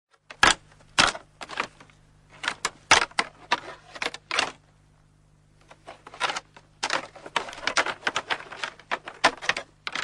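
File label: horns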